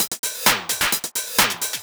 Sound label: 130 trance break, Drum & Perc Loops; Trance Explosion Vol1